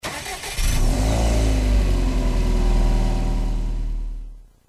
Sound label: engine start, vehicles cars; subwrxsti; tdmcars